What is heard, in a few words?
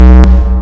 Ui Incorrect